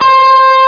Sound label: Guitar C3; Sound Library 2000; Wayzata Technology